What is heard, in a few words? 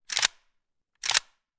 gun ak 47; still north media